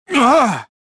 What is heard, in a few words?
gasp; jedi